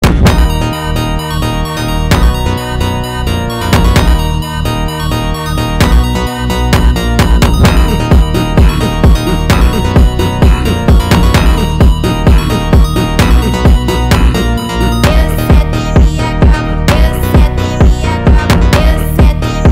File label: brazilian phonk